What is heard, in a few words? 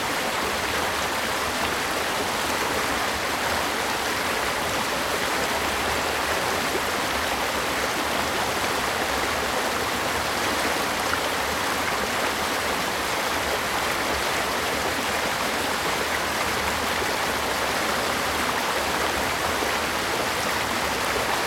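water weir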